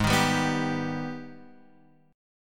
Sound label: Ab Minor Major 7th, down, Guitar, Open F; AbmM7; strummed